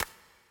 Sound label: Count Down